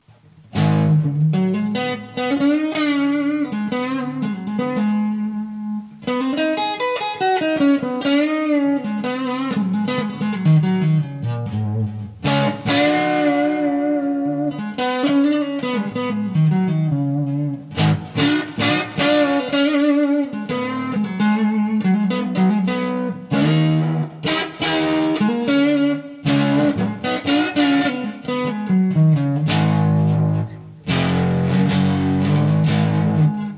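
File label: guitar with line 6 pocket pod guitar multi fx processor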